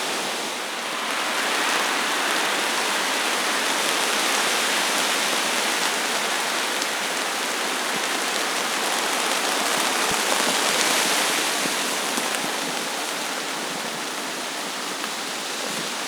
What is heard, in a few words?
Amb Weather; Red Orchestra 41-45